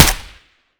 fire 762 sup, mk23; ARC9 uplp fesiug

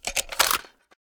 mag out, g36; ARC9 uplp fesiug

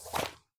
mag pouch in, weapons; Benny Placeholders

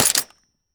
mech; weapons; m14; arccw ud

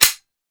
mech, weapons; CopsNRobbers

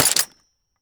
mech; Urban-Coalition; Decay; arccw ud

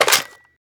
ch forward, m60; weapons; Urban-Coalition; Anarchy; arccw ue

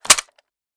mag tap, m16; Urban-Coalition; Decay; arccw ud

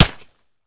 fire sup, glock; arccw ud

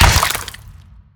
impact, violence; Benny Placeholders

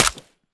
mech, m16 weapons; Urban-Coalition; Decay; arccw ud

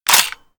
usas chamber, weapons; Urban-Coalition; Decay; arccw ud

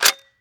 prefire, weapons m60; Urban-Coalition; Anarchy; arccw ue

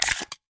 magout partial, glock; weapons; Urban-Coalition; Decay; arccw ud

